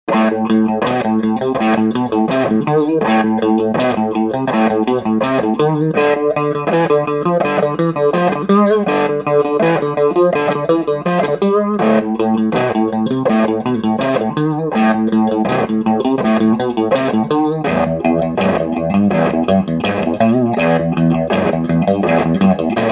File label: Tap Swing